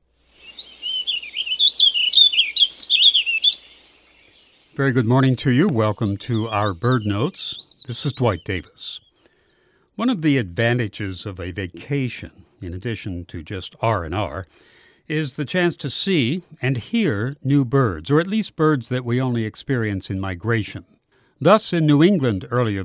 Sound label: Warbling Vireo